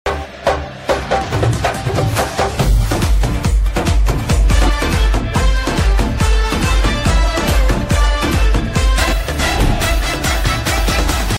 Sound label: hindi songs music ringtone; dream girl 2